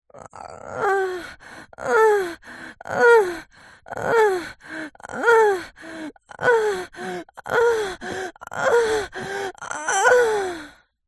human female groan pleasure orgasmic build up climax; zapsplat